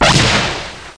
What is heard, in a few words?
plasma cannon